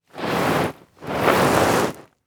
Impact Body Drag Through Rock Salt Two Times Short; Impacts